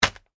packaging plastic drop, Plastic Packaging Hits Floor; Plastic Packet / Packaging Hits Floor; Materials; foley; McKinney Sound